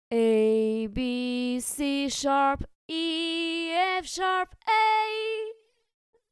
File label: sung scale